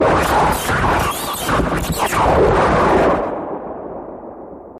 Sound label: Ah Pedal Steel Zaps, arcs sparks